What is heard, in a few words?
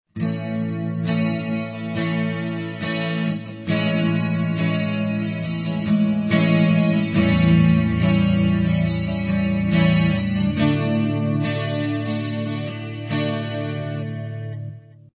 A Amaj7 A7 D, guitar